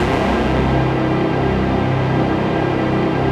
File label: PAD, Dance Mega Synth